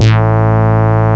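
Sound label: brass synth, ANALOG; CMI Sound Library Rev 1.3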